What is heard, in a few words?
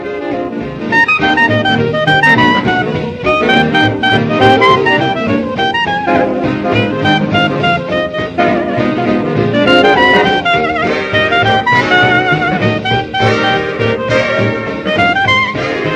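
orch clarinet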